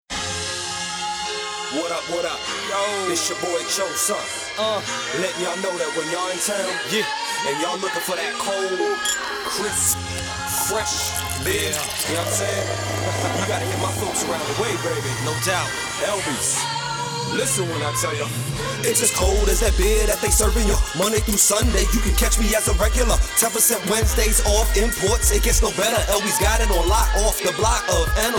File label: Jingle